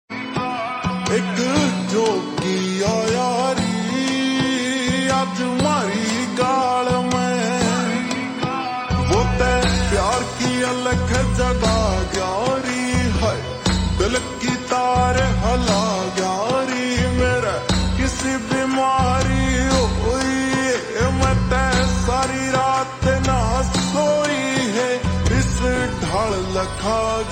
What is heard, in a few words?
Slow Reverb Version Ringtone